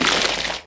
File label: mined, mining; cuttlepop; Factorio